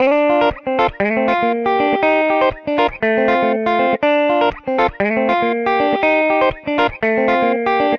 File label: Guitar African Funk Gm 120bpm; Guitar and Bass; Ableton; Factory Packs